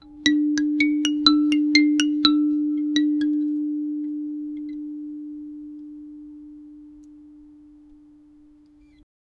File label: a single resonant pluck, single note